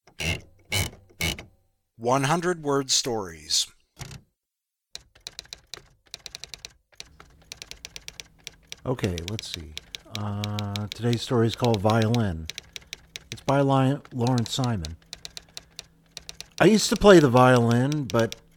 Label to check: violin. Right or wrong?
Right